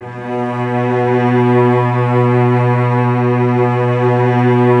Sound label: cellos B 2, String Ensembles; Miroslav Vitous; 90 s Sample CDs